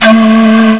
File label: pan